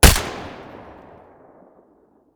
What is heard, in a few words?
ak105 silencer mono, weapons; STALKER; IWP